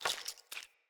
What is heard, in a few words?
Foley Jump; Friendly; Avakot